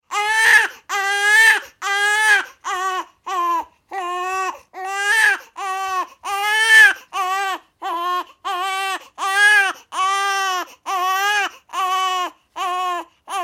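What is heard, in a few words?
sounds of newborn crying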